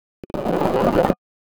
bottle

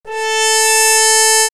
Hand Mute a4, horn; dsp2008 sound library

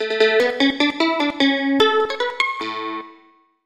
mandolin

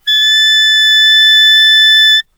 Clarinet Bb ff A5; dsp2008 sound library

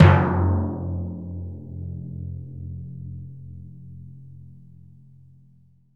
timpani

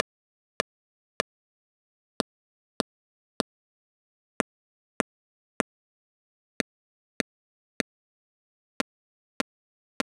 Snare vs Snare

